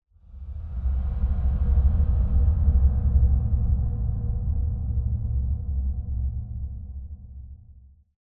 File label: Rumble Scary Voices Short Pad; Hollywood Edge; sound designer's toolkit v2